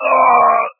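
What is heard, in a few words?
moan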